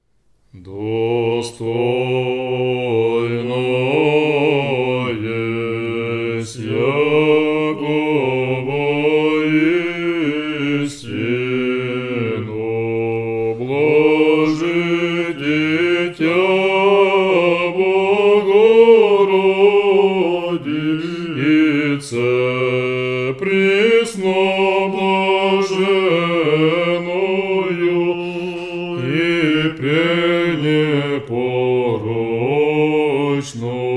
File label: it is truly meet tone 3